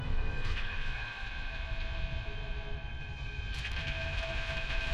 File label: funny